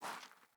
Boot Walk Gravel, Footsteps; Enemy; Sinecure; Melee Grunt; Boots

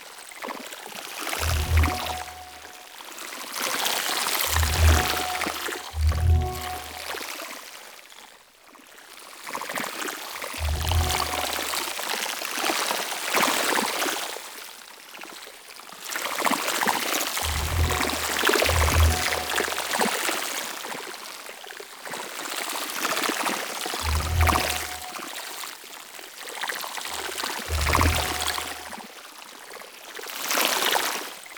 Mushroom Loop; Warframe; Nokko Colony; Ambience

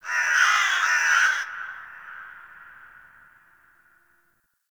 Forest Creature Vulture, Ambience; Warframe; Grineer

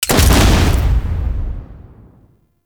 Pod Shotgun Shot; Warframe; Weapons; Tenno

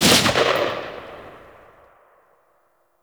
Grineer M16 Rifle Tail; Weapons

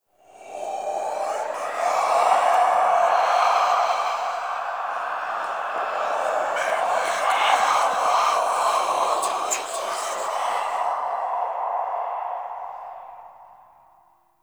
Whisper Blood Enemy; Warframe; Ambience; Entrati

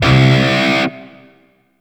Shawzin Flourish Oct One C; Warframe; Grineer Shawzin

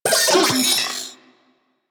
Vocal Alerted; Warframe; Corpus; Mini Moa

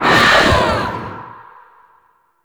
Hysterical Assault; Warframe; Berserker

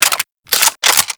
Rifle Reload; Warframe; Tenno; Tenno Drake Rifle

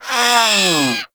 Feral Vocal Death C; Warframe; Catbrow

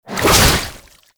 Underground Attack Tentacle Swing; Warframe; Abilities

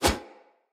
Split Sword Whoosh Fast; Weapons; Player; Swords; Starter

